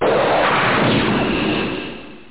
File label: JET; LiveWire